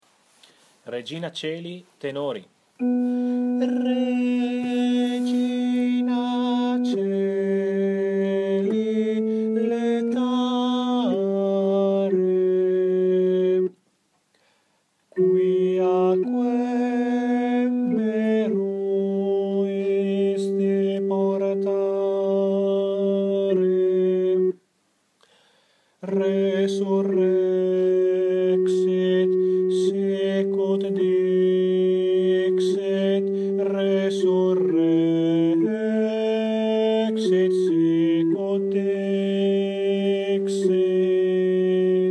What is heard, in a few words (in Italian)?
tenori; regina coeli